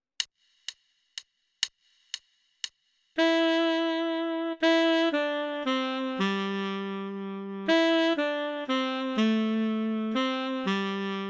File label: melody only